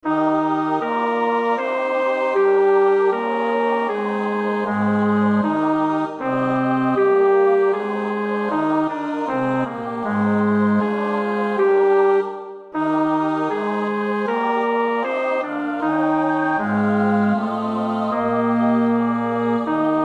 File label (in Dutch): bas, koorpartij oefening; Bach (Johann Sebastiaan); BWV 245 Johannes Passion; Ach Herr, laß dein lieb'